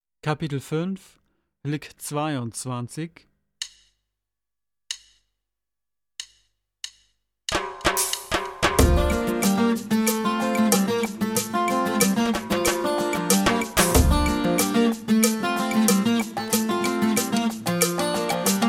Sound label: Latin Style Guitar